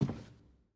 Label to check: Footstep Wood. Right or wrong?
Right